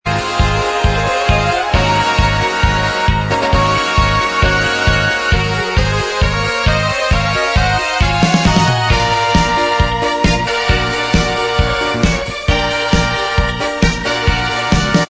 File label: Inst